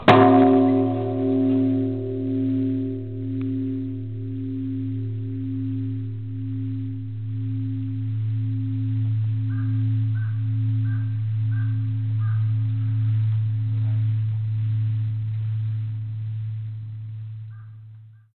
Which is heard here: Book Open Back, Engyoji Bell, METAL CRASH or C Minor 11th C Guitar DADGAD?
Engyoji Bell